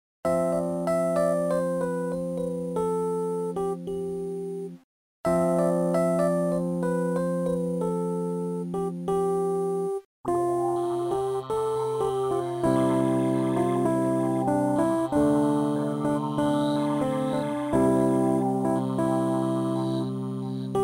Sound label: moving by electricity